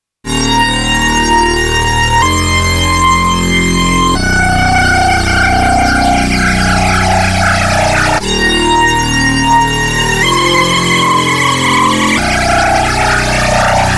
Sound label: LFO vs. Osc Freq; Pro-12